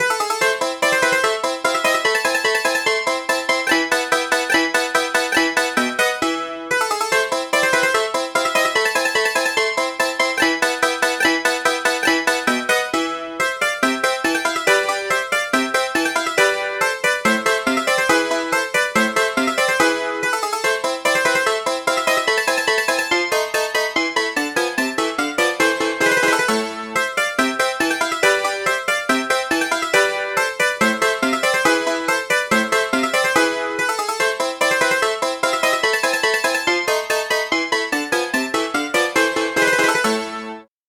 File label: dulcimer